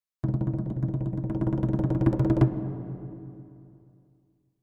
Drums Percussion Danger - Fast Drumming On A Medium Percussion; Hollywood Edge; sound designer's toolkit v2